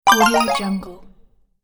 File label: mini game fail